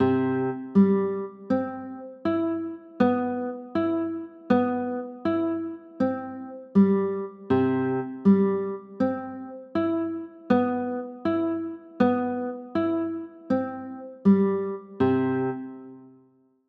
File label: c maj7